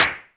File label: Clap; TRX